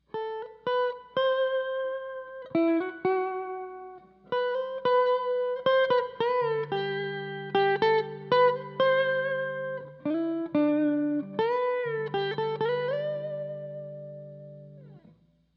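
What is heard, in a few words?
electric with amp; Shure SM58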